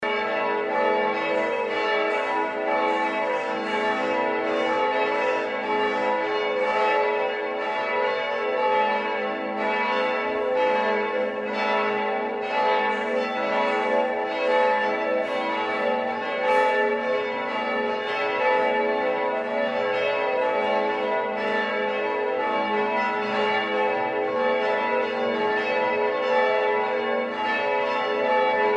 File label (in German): Klingelton; Liebfrauen